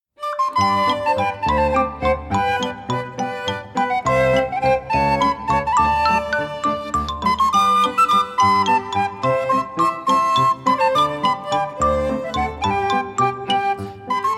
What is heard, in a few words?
Irish Dance, Violine, Tinwistle, Vibraphone, Klavier/Akkordeon; Linder-Verlag